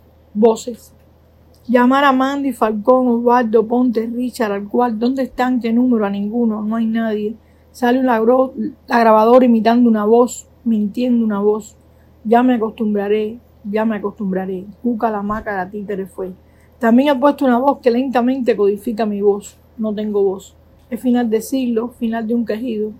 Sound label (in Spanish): Voces